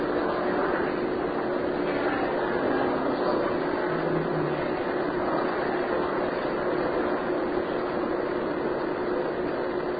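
2nd Floor Room Entrance to Attic Unexplained moan or voice; Turner Home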